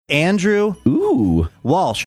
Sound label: ooooh